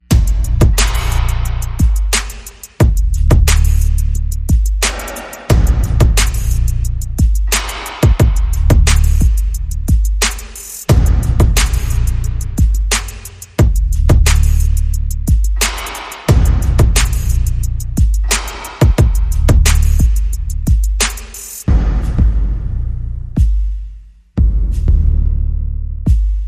Bass and Perc

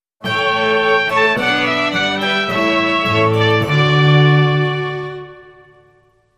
Hornpipe Sting

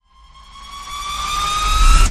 Rev Floor It

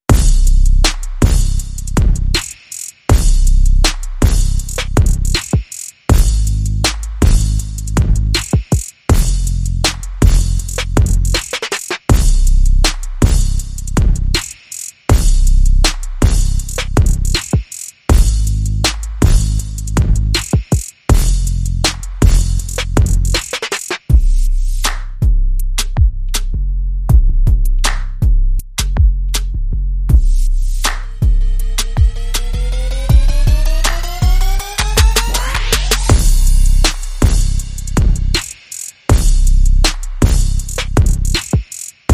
Bass and Drum

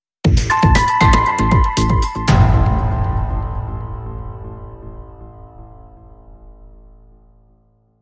Bumper

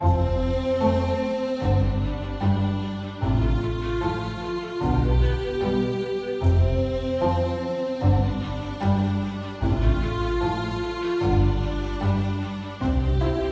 Underscore

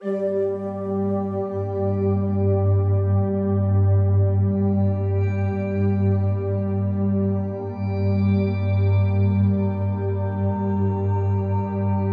Ocean Waves Underscore

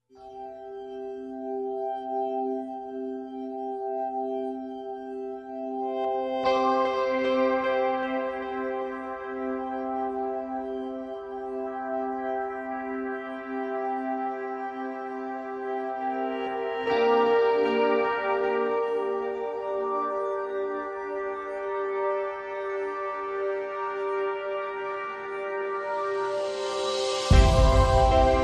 No Pulse

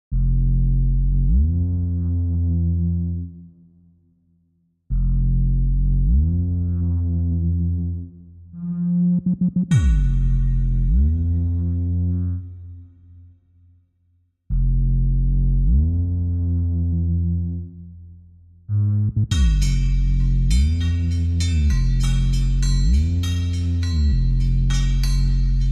Drums and Bass

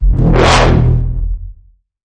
Bend The Bassline, E

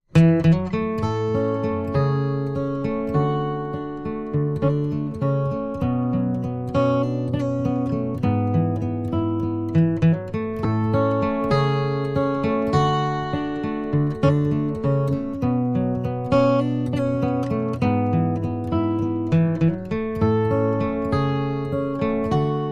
Sweethearts Lullaby Solo

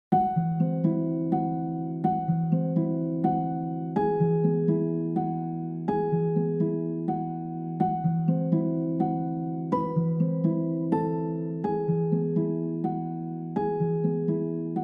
Harping Flow Harp Piano Glockenspiel; 30sec

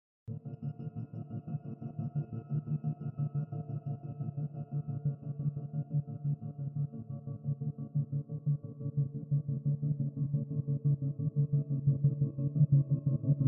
Dark Ambience

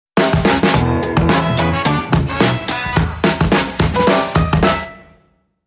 Sting

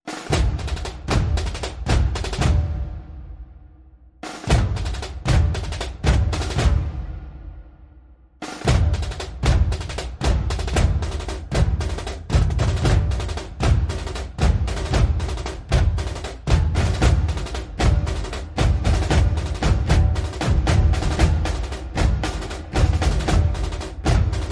Perc Only

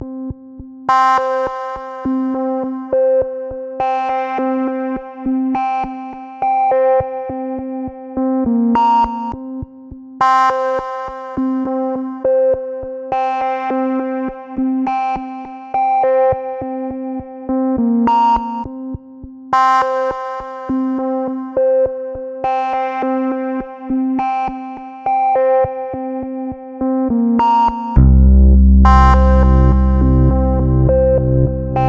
Siren Sound No Percussion

